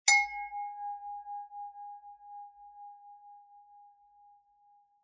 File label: 9 Alu Bell lip; Sabian